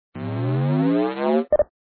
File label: Charge Up; smashing